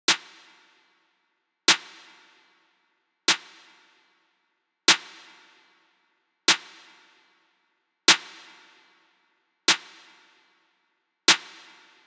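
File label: Drill Drums Snare Solo Reverb Before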